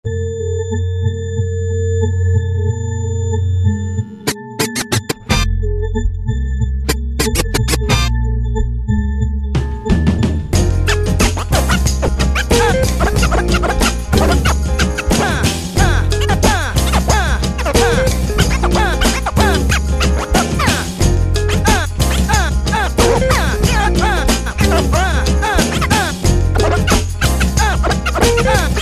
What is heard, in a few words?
jazzy scratch